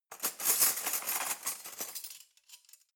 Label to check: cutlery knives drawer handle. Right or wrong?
Right